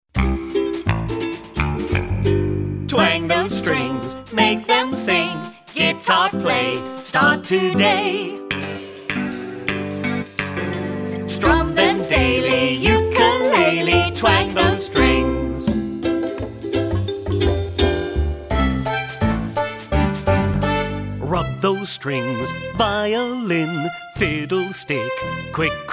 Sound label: twang those string full vocal